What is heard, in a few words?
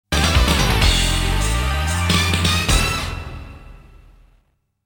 Win